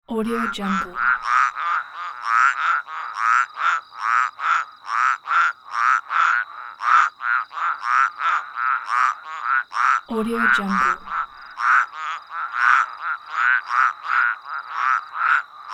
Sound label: frog chorus ambience; sound ideas